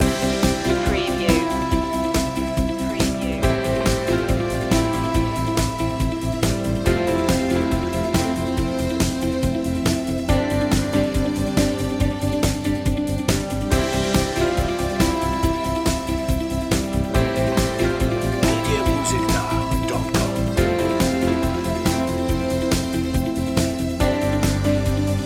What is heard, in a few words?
new point of view loop